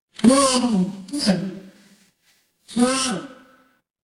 mechanical lever

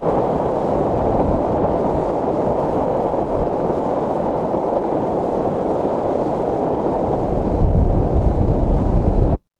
ambience wind

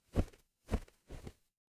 paper fabric cloth whip

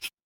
magic scifi hologram

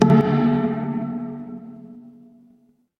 instrument gong

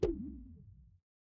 cartoon pop

